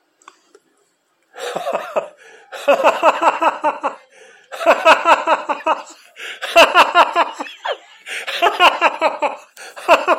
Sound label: Man Laughs